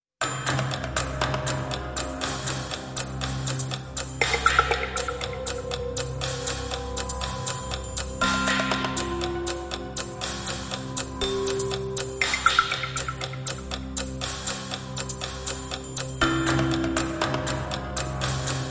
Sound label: Ticking Again